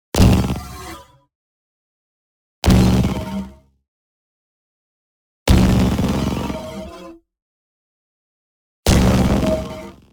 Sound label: HIT SMALL Proton Collider; NEON; boom library